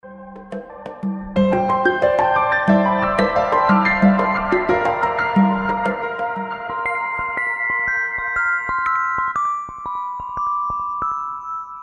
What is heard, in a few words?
Soft Bells Conga